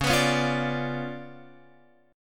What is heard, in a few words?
C sharp 7th Flat 9th, Guitar, Open F; C#7b9; strummed; guitar chord